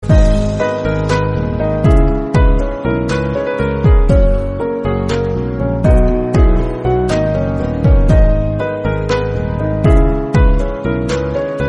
instrumental bgm

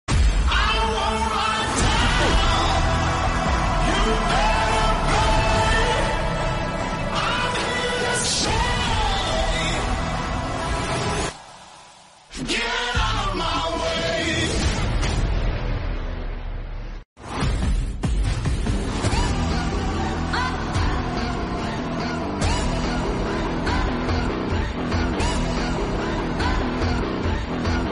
background music

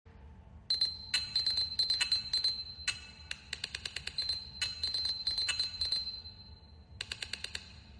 nothing 1 ringtone

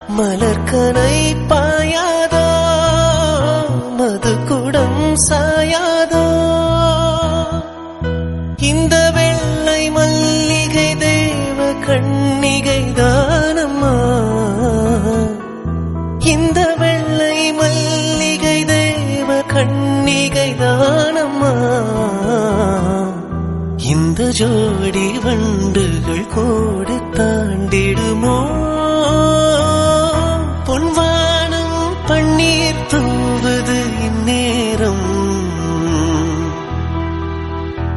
tamil

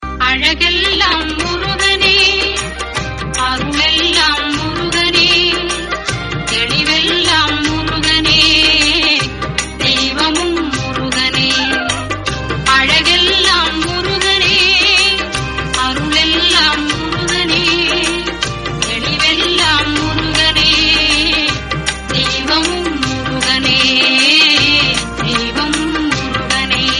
god bhakti

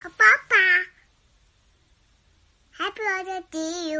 happy birthday to you

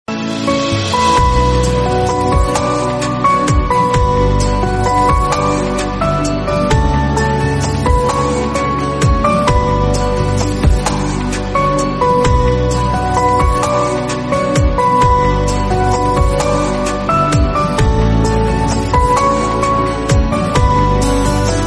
instrumental hindi

